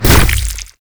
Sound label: Tentacle Swing Hit; Enemies; Abilities; Warframe; Proto Infested Ancient